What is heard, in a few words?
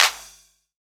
CLAPS HIP, CLP